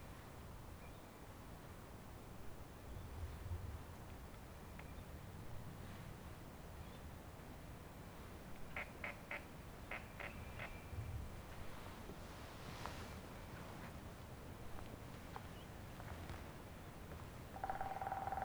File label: squeak; approximately three hours of kent-like calls and analysis part 1